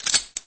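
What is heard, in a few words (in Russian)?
усп затвор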